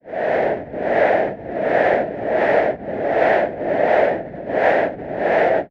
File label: synth Ellipse